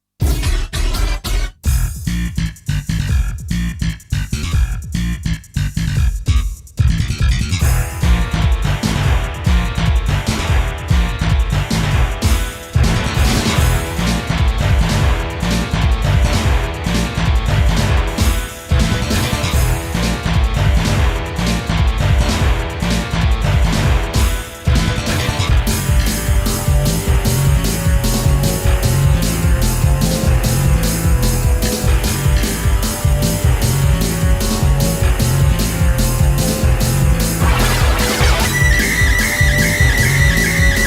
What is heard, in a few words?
Scrapped Beat; MMZ3; X6 riff